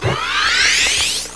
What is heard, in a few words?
1up appear; GAMECUBE; SUPERMARIOSUNSHINE